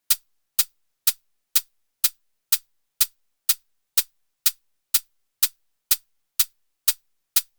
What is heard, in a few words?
HATLOOP 124BPM